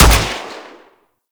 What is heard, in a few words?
Fire, Weapons; Gorgon